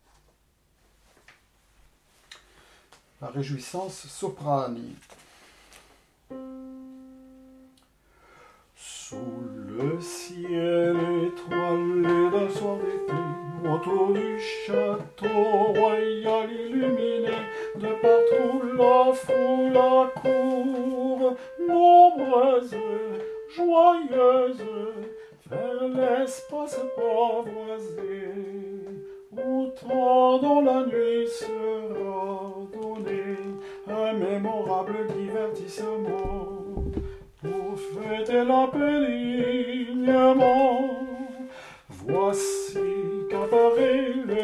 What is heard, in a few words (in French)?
soprane; la rejouissance